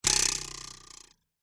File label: cartoon spring twang bounce metal ruler twang